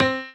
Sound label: piano melo